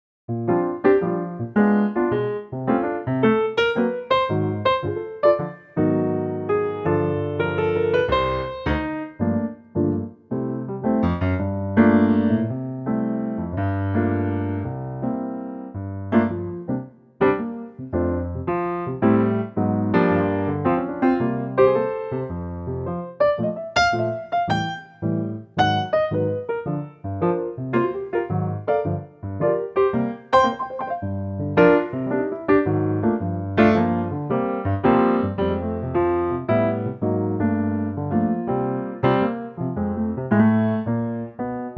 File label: F Piano